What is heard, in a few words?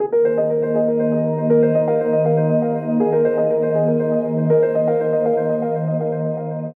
system ringtones abstract